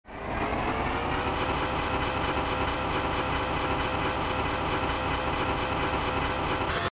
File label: motor; tunnel of dreams